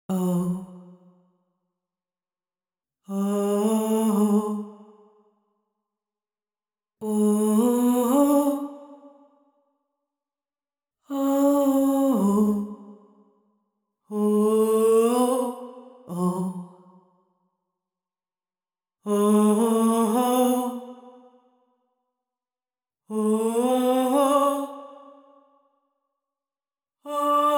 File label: Vocals with FX